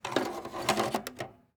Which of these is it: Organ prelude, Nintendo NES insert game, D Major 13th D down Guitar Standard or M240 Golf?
Nintendo NES insert game